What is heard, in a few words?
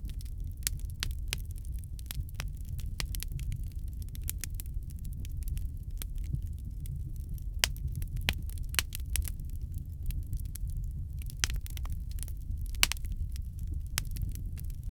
crackling fire just fire